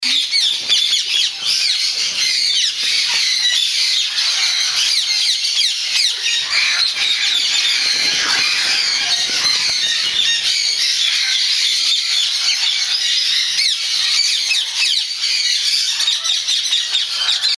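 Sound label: Ring Necked Parakeets, parrot calls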